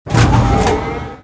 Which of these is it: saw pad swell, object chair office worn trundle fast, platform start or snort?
platform start